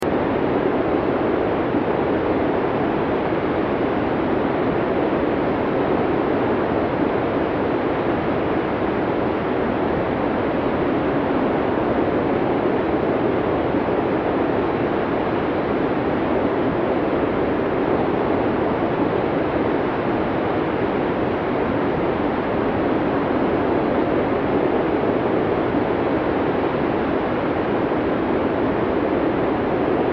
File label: Wind Blizzard Winter Snow Drone Steady Constant Loop; Weather; Wind Sound Effects; Blastwave